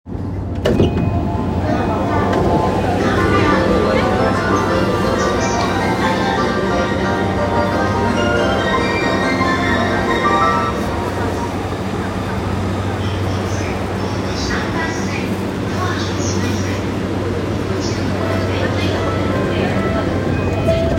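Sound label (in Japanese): JR京浜東北線，山手線神田北 counter-clockwise, train sounds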